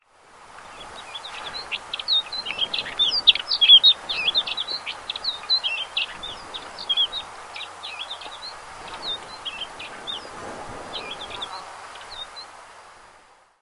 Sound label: Prunella collaris; 岩鷯; Song; 日本; 尖細婉轉的「唧哩—唧哩—」聲，略似平地小雲雀的歌聲，距離近時可聽聞似摩擦塑膠袋的聲音; 於日本錄音的 S-04 為不同亞種 erythropygia; Teruo Furushima, Wild Bird Songs in Japan